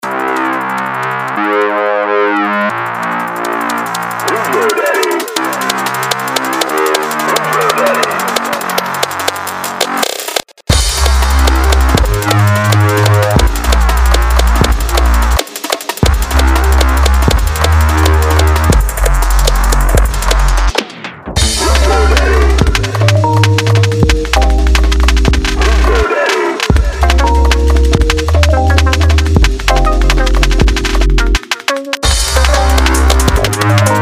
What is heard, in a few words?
dnb